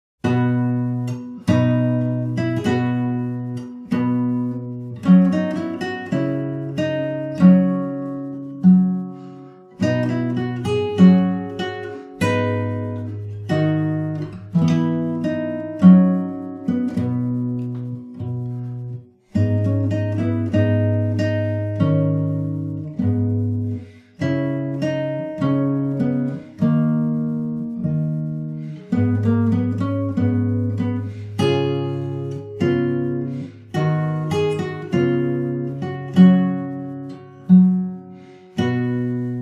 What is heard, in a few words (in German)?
Gitarre solo Tempo 100 ohne Metro; Tochter Zion